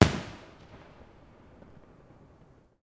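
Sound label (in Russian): праздник весны фестиваль ежегодное собрание празднует фейерверк звуковые эффекты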